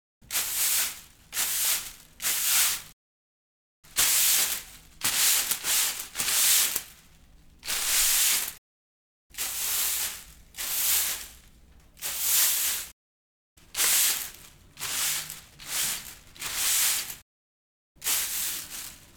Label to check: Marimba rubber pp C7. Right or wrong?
Wrong